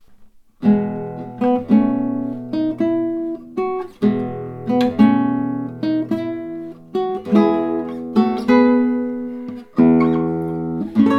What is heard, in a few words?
prelude